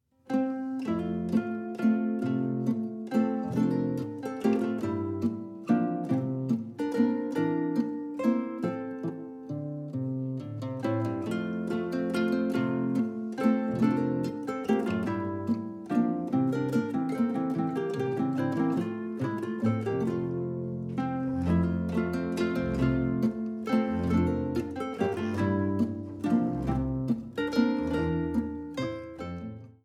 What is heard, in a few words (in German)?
verzaehl, saitenmusik